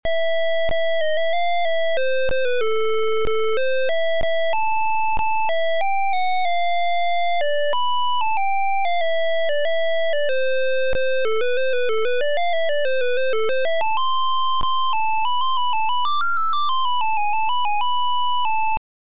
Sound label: MELODY